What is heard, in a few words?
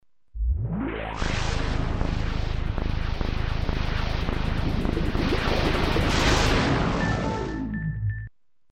Xbox Boot Sound Jingle An Xbox Makes When Turned On